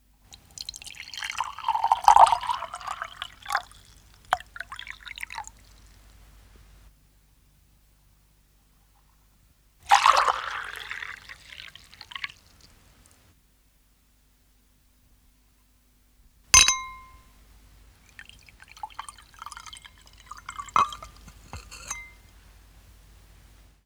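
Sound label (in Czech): skleničky nalévání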